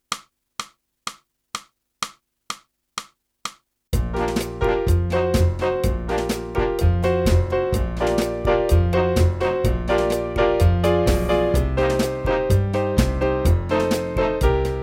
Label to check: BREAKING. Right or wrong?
Wrong